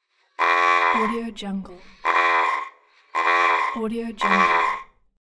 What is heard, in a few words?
forest grouse